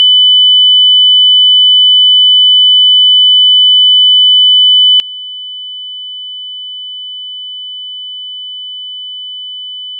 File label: loud soft mp3; android 9.0.0